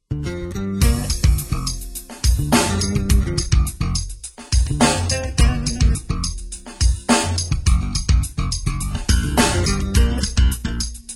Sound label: heavy blues blue bass blues